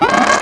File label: gurp; QUAKE2